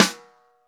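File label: Snare, Roland; Club-50 - Foundations Roland; Naturals A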